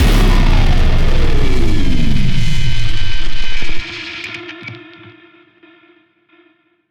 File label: Music BOSS Death; CP Music BOSS B2 Death 03